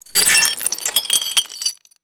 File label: ceramic debris dumped on wooden surface